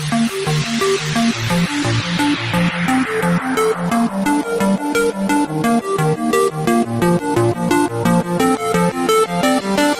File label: drumstep